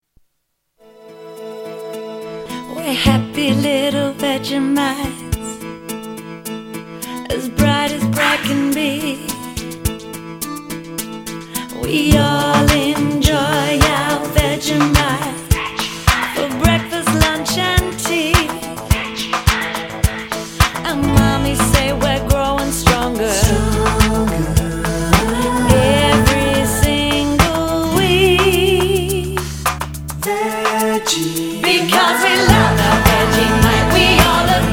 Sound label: Jingles